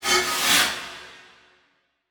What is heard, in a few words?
Transition Hit Impact Warble; Production Element